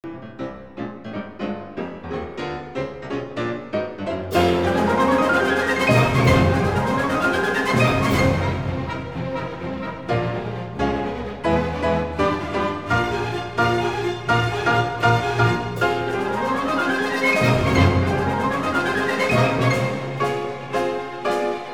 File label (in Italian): allegro agitato